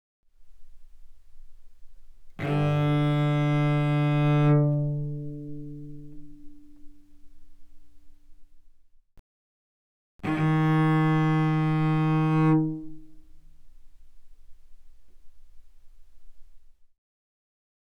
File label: Cello arco ff sul D D3 Db4; mono; Strings